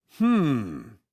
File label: hmmm